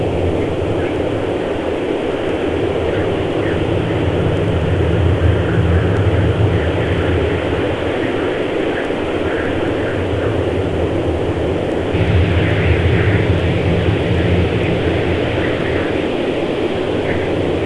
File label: WFR audio emfisis